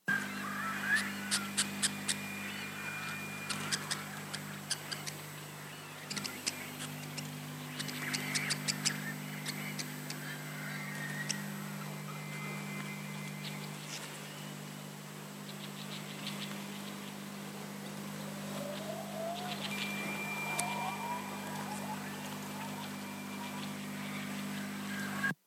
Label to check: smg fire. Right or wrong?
Wrong